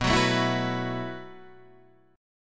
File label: E Suspended 2nd Suspended 4th B